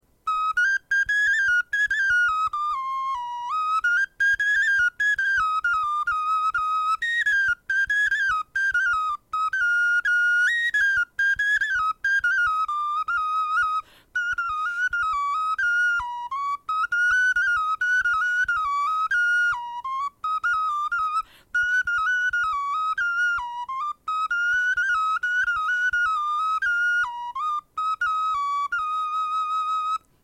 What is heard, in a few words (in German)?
melodie